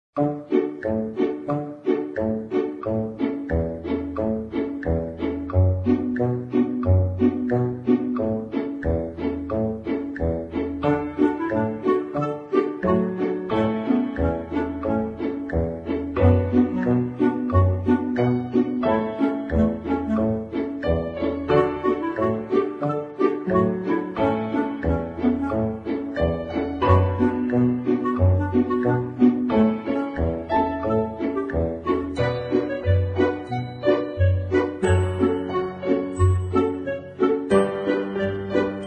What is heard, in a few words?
background